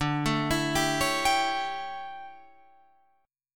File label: D Major 7th Suspended 2nd Suspended 4th D down Guitar Drop D